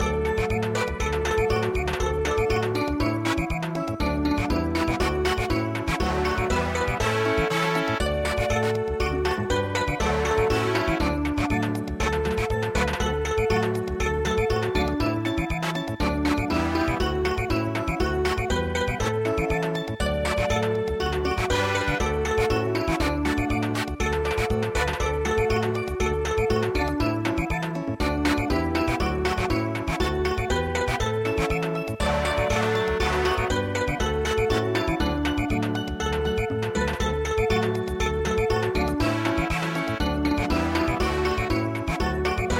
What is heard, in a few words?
momotarou Samba